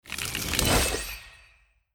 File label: time Pause